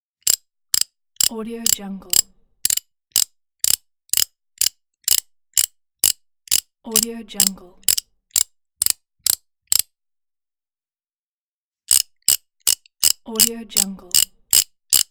ratchet wrench

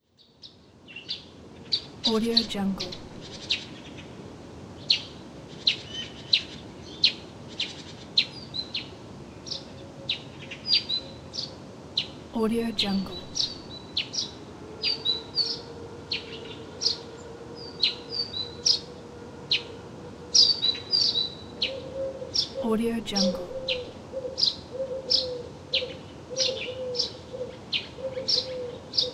birds in forest atmosphere

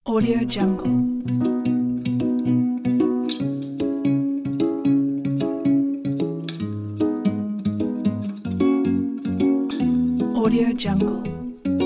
happy upbeat

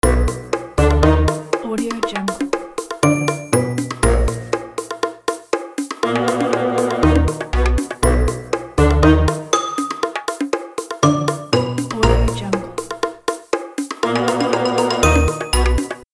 cute kids game loop; c-orchestra

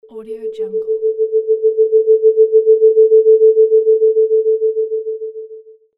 ufo; creativetunes

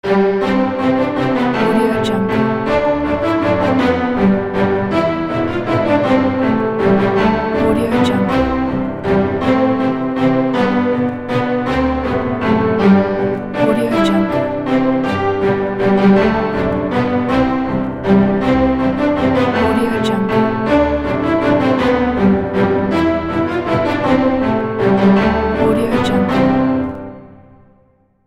we wish you a merry christmas ident

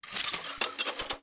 mechanism move bot small trasher; soundmorph

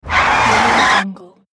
brakes screeching

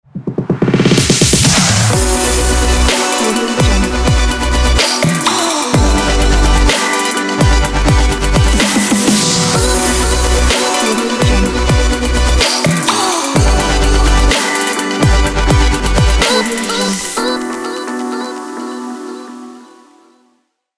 digital logo

